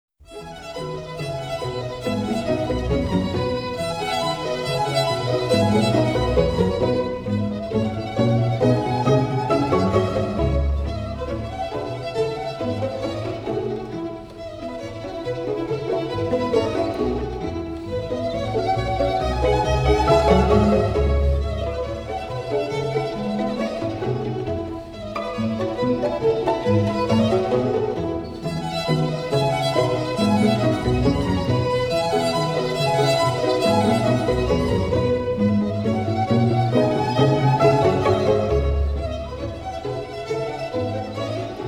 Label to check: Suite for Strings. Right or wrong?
Right